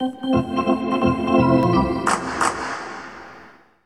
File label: Hockey Organ